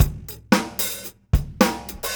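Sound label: Beat Loops, 111 bpm; Zero-G - Monster Beats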